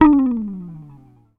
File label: HOFNER, Bass